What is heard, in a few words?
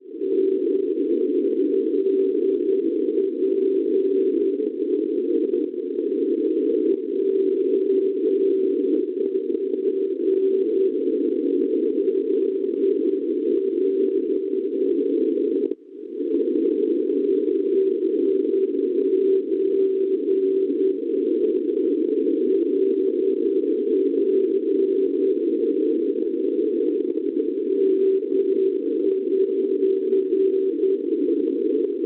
NDB, 318 kHz; LP; Ronneberg; Svezia; Schwarzsee